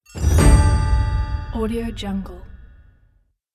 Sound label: win prize